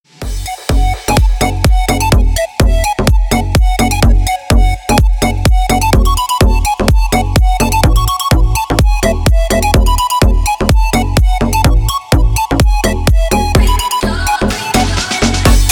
Dance Polka

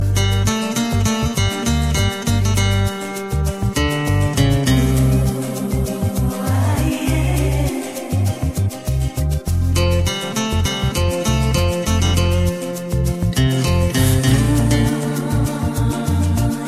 guitar melody

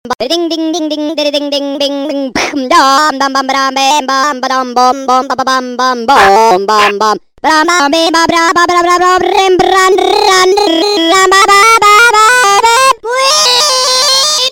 ding ding ringtone

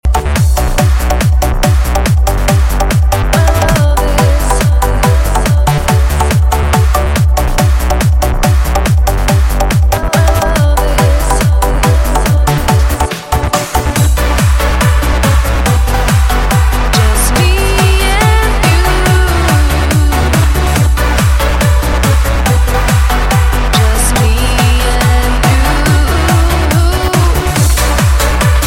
beach party

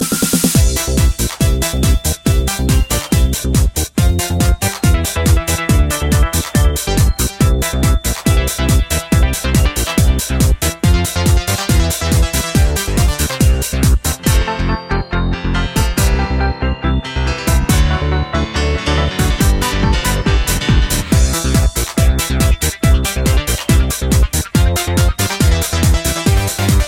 jingle bells mix

